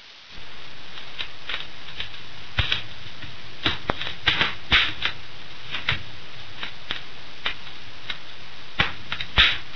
feu cheminee

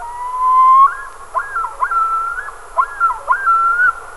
cri

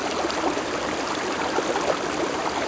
ruisseau; xtrem

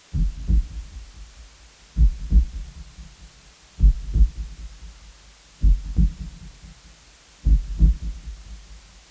son peur; de enfer2k

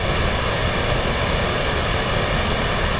cascade loin; bc temple

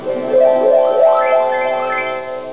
Harpe; de christmas

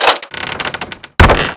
planche tomb

